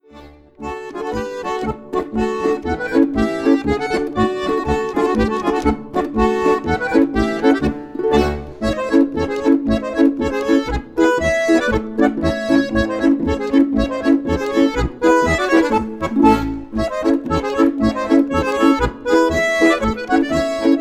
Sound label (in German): polka